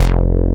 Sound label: MAD BASS; SAMPLE WAVE MIXING 1